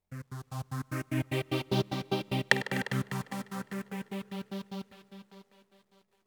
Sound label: Oneshot Synth And Perc; zombie army 4 dead war ps4 stadia switch windows xbox one gamerip 2020